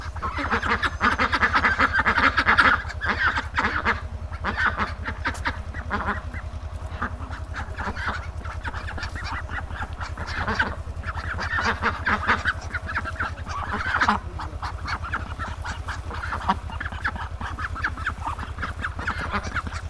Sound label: Ducks On Field